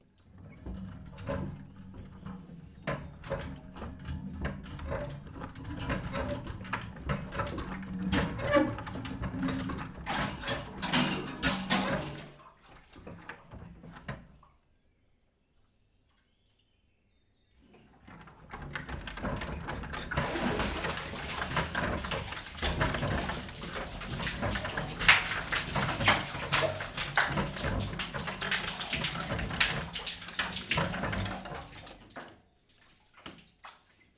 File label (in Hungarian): lassu vizhuzas, viz visszaontese, kerekes kut felul zart; vízház; cardioid